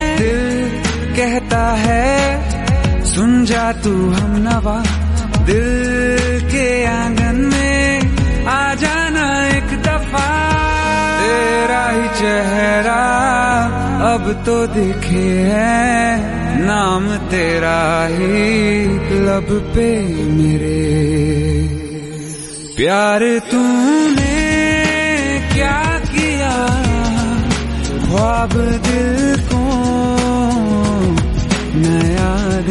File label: hindi song ringtone